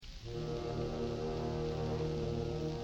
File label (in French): accord parfait Mi